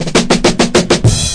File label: reggae break, DRUM LOOPS and BREAKS; FILLS and ROLLS